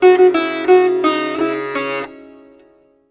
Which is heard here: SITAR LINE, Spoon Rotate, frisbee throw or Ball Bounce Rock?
SITAR LINE